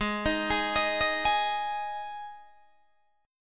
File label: G sharp 5th G sharp Guitar DADGAD